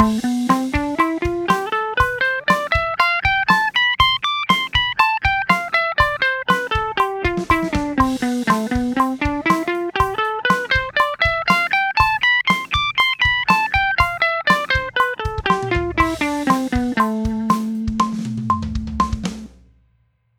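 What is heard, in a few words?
Am Aeolian oct bpm120 eighth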